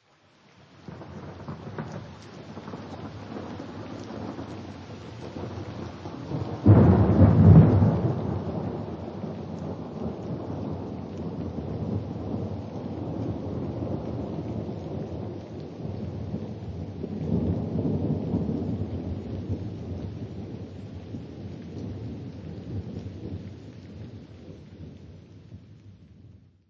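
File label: beginning of rain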